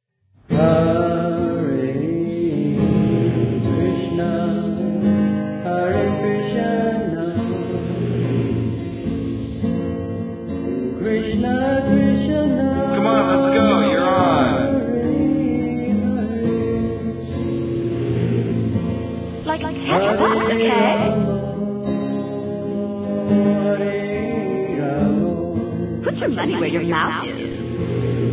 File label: Male Balad